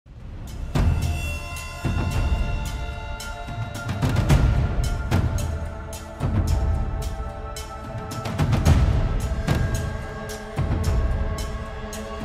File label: Snow Battle Music; ARK Survival Evolved